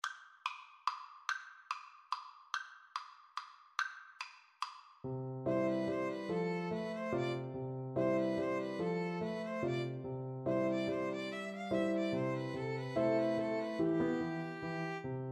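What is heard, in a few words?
flute violin karaoke; Violin-Flute Duet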